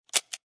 Pistol Revolver Dry Fire, Pistol Revolver Dry Fire Three Times; Revolver dry fire / misfire click, three times; Gun Handling Sound Effects; LynneMusic